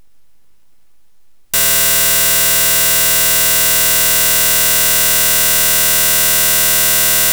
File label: noise